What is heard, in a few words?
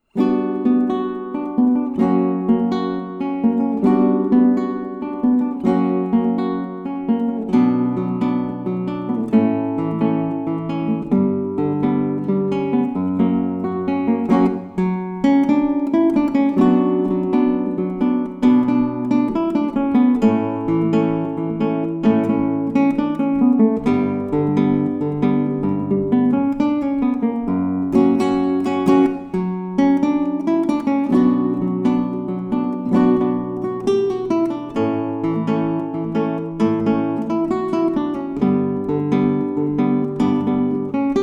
Rumba